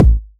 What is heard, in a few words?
Kicks Electro